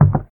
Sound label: Wood soft; Devour 2021 gamerip pc macos